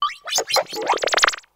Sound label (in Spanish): Grito de Glimmet